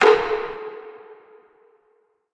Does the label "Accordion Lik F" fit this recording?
No